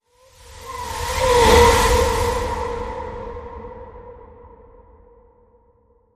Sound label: Strange Windy Whoosh